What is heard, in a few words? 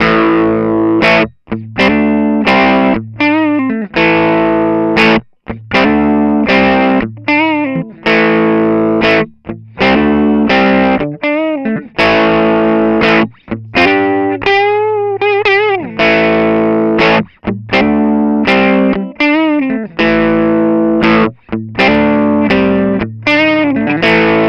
Yamaha THR30 II Boutique Crunch